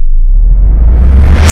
cannon prefire; Titanfall 2

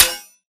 bullet light ballistic blades deflection

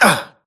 grunt hits; Titanfall 2

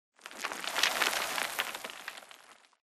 Rock Debris Small Short; Boomtown; Titanfall 2

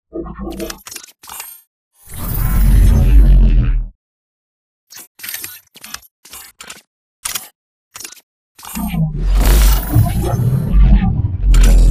Casual Idle; Titanfall 2

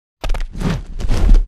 flyer land close; boneyard; Titanfall 2

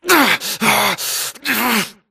grunt Struggle; Titanfall 2

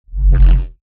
Movement Jog; Titanfall 2

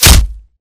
bullet light ballistic flesh; Titanfall 2

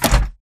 Mvmt FS Walk Default; AI Stalker; Titanfall 2

